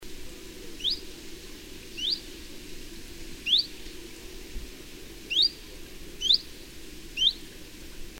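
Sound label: Chiffchaff calls; 12.10.2003 Bierbza, Poland; Linnut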